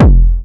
Jomox Kick; techno drum fx; Analogue DrumHits

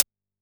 DnB Drum Hit Hat; drumnbass 170bpm; Drum Kits; musicradar; Drum Hits B

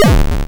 YM Kick, DRUMS; KICKS; ChipShop Deluxe; ChipShop Hits

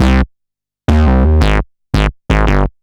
Bass A dist 170 C, drum n bass 170bpm; musicradar; Basslines A

